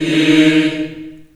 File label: D#3, Choir